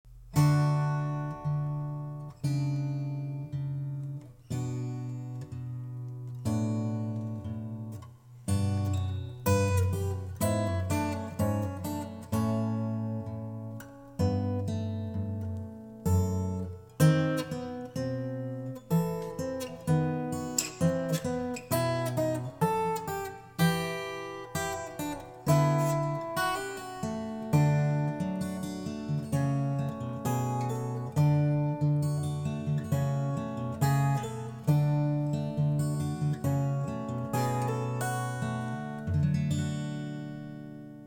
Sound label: Air in G Bridal march, guitar